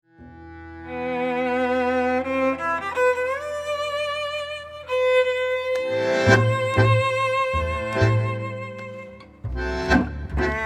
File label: TZIGANE TANGO